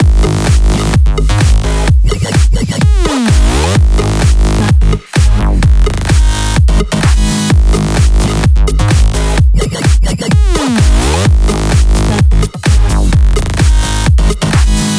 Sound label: 128BPM Mix; Vengeance Samples; Speedy Kit